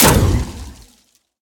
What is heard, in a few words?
fire; smite; medusa